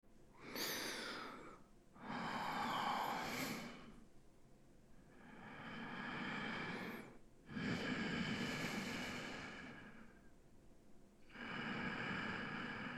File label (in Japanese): 寝息声; 哄睡; 女忍者监禁～任务失败的我被战国武将给强上～; KU100